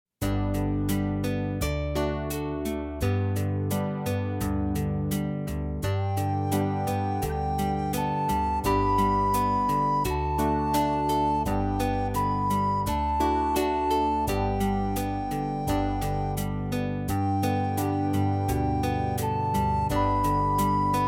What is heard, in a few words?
recorder au claire